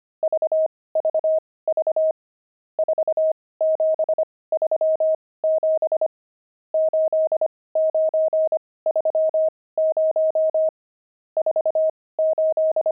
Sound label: pangram, intermediate pangrams files